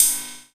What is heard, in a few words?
Rythm-55; Best Service Gigapack 2